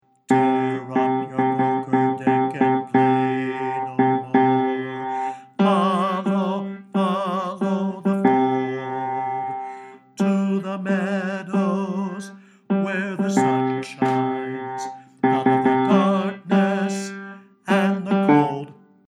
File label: bass